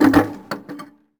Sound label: Cello Hit; SFX Kit; Foley - Misc